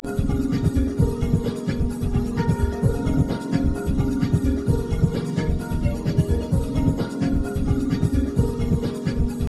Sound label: harmonics only; sutra; COMP clear